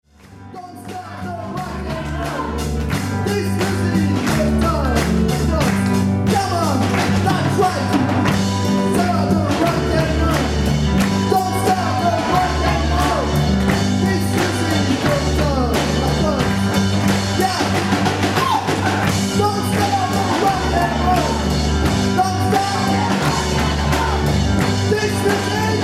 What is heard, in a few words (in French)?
ambiance fin de concert